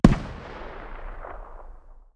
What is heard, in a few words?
hmg dshk medium distance, 12.7x99mm; tacz-tweak-pack; taczmechanics